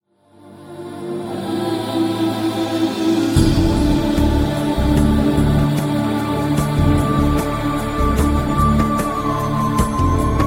love song heavenly

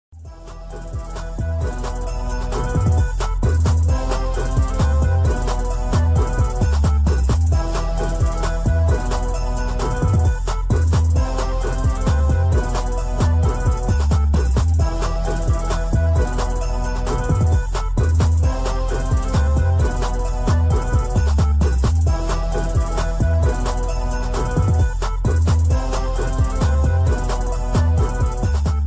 instrumental

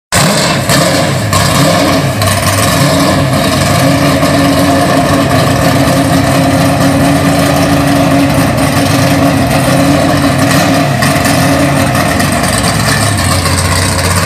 chevy copo drag car

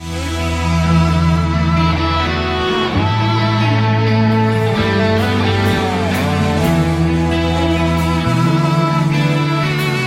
violin; Starcraft

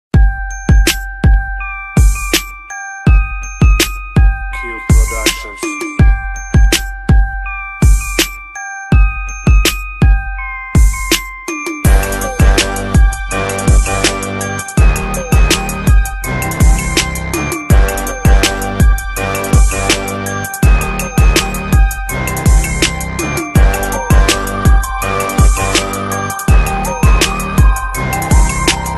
gangsta beat; hip hop

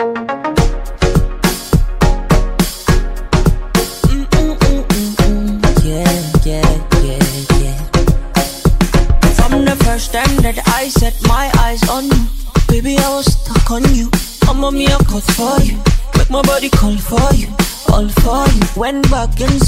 Romantic

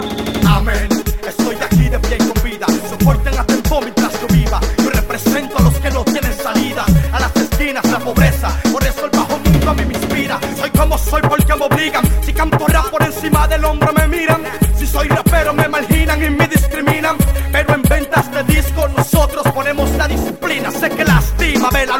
free tempo; latino

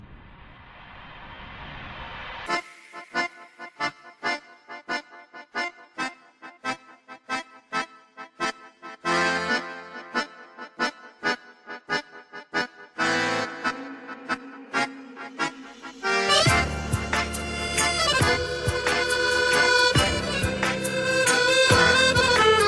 Tango

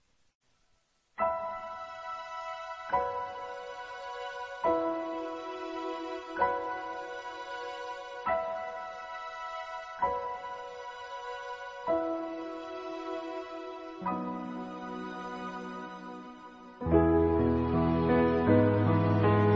karaoke

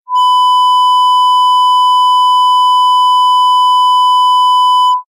1khz 0db

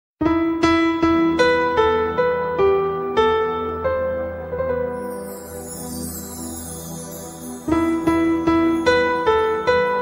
slow, ringtones

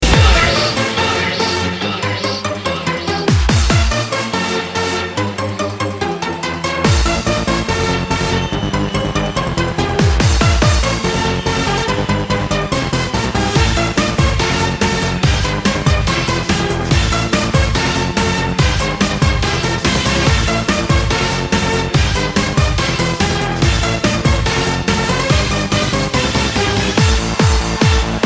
trance rave

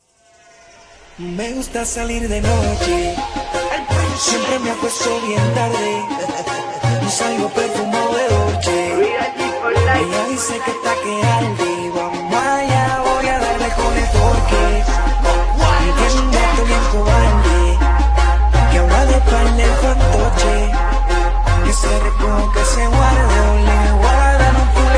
reggaeton remix

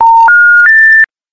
telephone

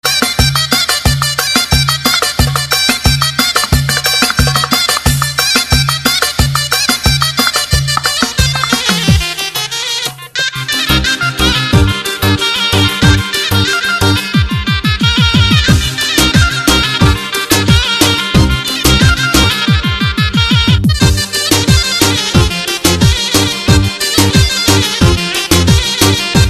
Zurna; Legend

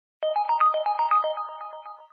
new android tone; ringtones

